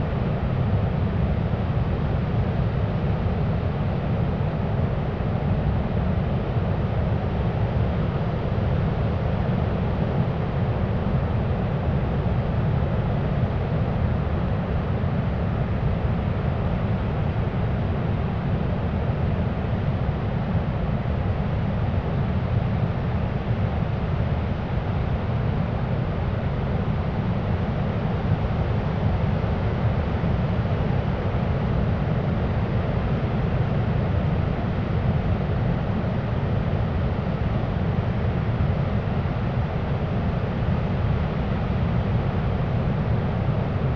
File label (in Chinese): 沙滩呼啸; 海浪聲